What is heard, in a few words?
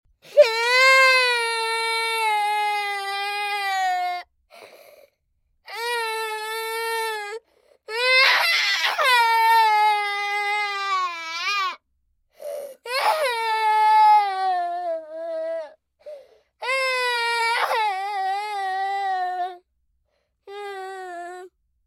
crying; hatapon